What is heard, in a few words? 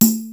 DR-660 DNC, DRUMS PERC; BOSS; Rubber Chicken Software Sample Bank